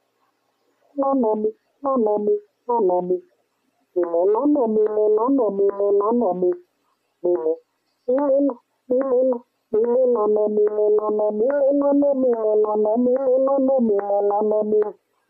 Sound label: Vocal Beat with Multi Effects Pedal